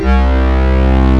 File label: MOOG LEAD; Kid Nepro; Elite